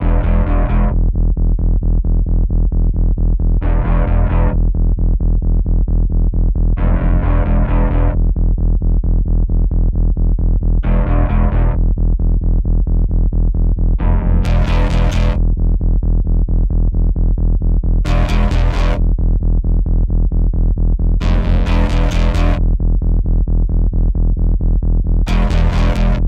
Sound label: heavy sounds; wildcat gun machine ps4 switch windows xbox one gamerip 2022